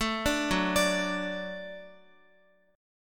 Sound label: F sharp Minor Sharp 5th, Guitar; F#m#5 arpeggiated; x,x,7,7,6,9; 4E,4A,5D,5G,5C,6F; chord shape